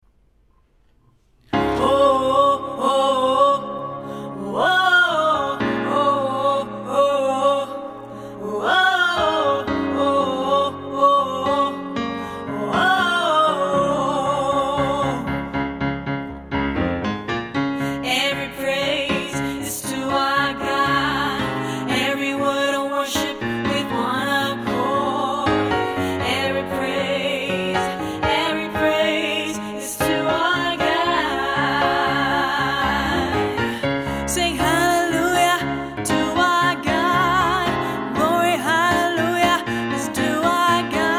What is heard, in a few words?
Alt, vocale partijen presence choir; Presence op het Malieveld